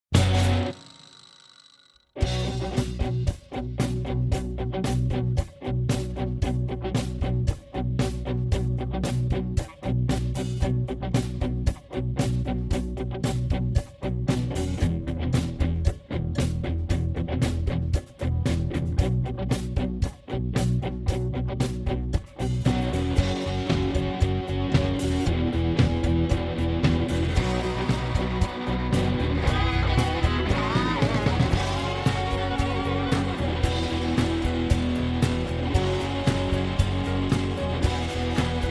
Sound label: backing track